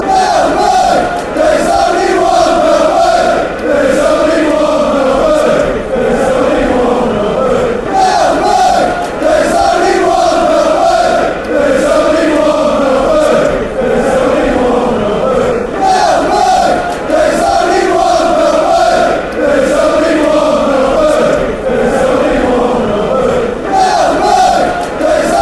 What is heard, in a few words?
chant; olaf mellberg; The Villains